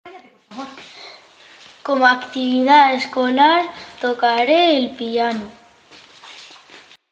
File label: piano